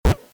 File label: Pong